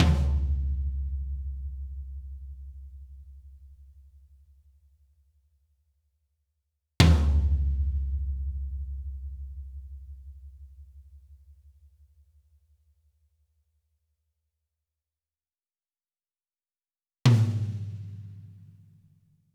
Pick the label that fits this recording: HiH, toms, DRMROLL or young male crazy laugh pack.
toms